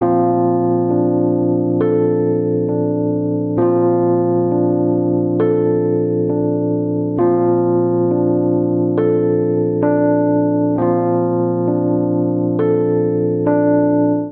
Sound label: Ostinato Cinematic Bb Major - Ab minor 67 bpm, Electric Keyboards; MIDI Clips; Ableton; Factory Packs